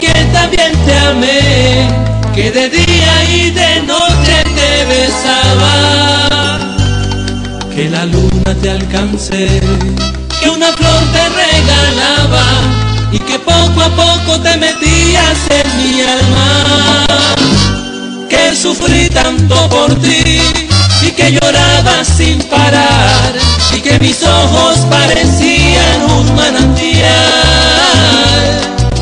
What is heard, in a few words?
Salsa